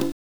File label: Bongo, Genesis; The Lion King; M8